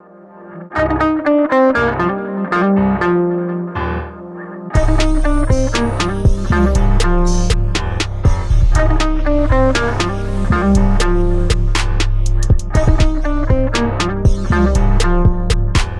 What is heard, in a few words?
Beat